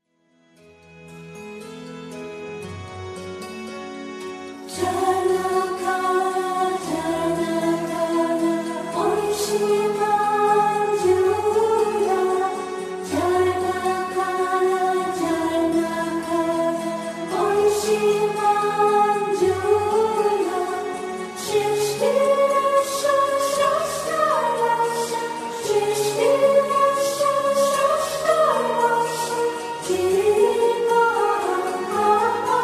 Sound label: Bhajans Bhajan Singers Sing